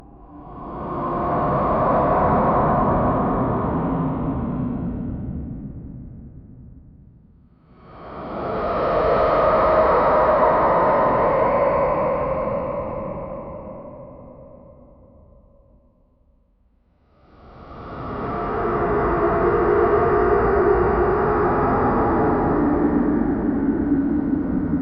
horror monster sound

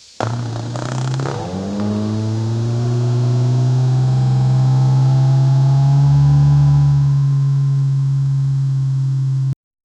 aggressive exhaust pop

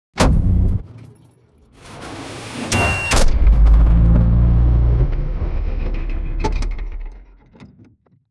transformers style car, transformation

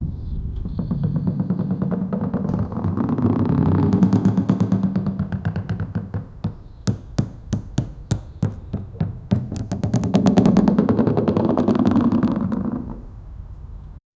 baseball rolling on the

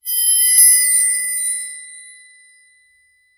harmonic ring metallic

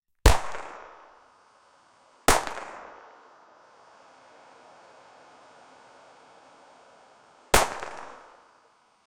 a single shot from a, rifle

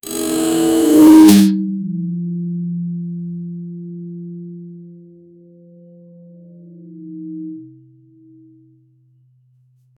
big sharp blade vibrating

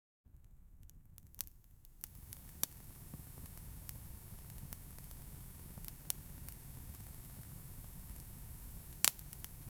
flame crackle sparks of; elemental

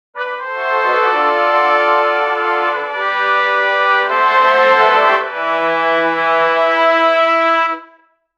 sound, brass

